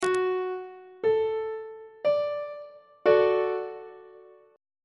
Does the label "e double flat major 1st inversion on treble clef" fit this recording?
Yes